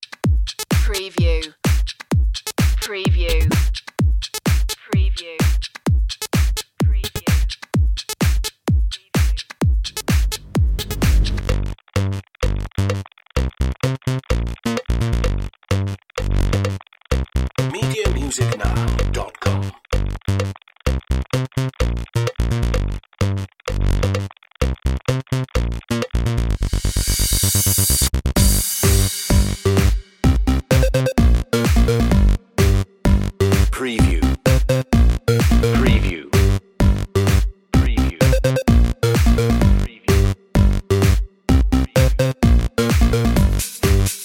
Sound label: pulsing beat